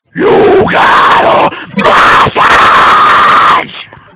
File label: Screamo Message alert